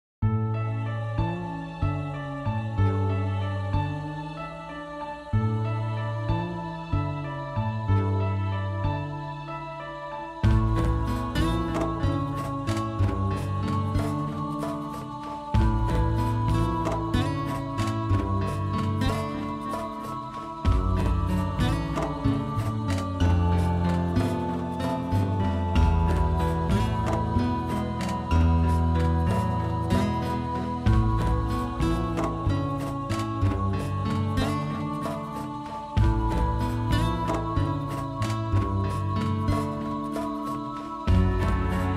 Mall Music; dead rising

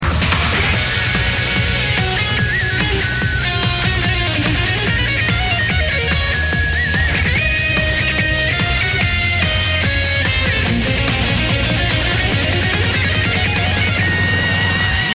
Solo; gundam seed

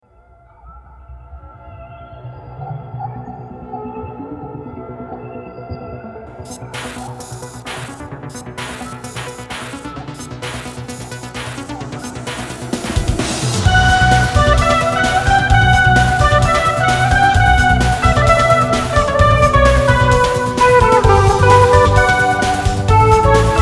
Painful 2 battle theme Guitar Cover; fatezero

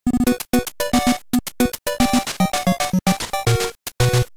Game Over; castlevania

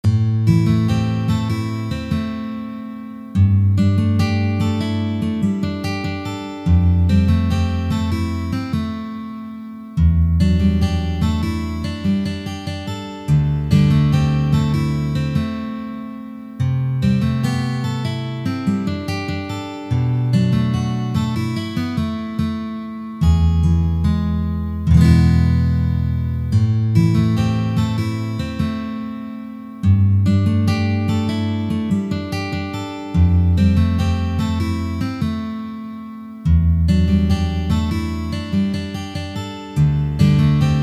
Ending Strings; Hell Girl

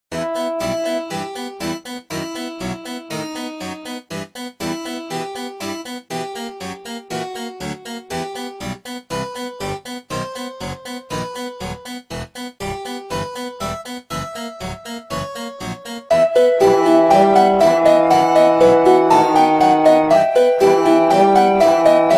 Harpsichord Version; trilbys notes

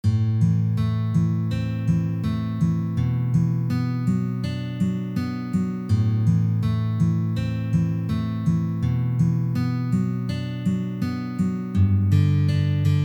Guitar; metro 2033

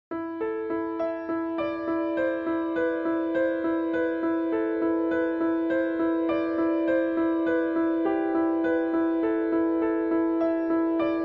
piano; castlevania 2

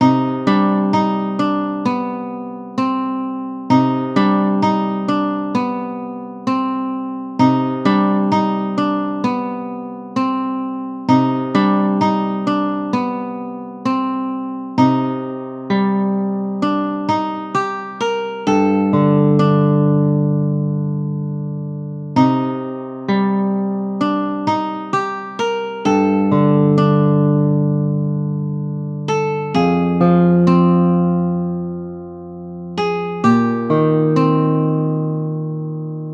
Mysterious Atmosphere; vampire knight

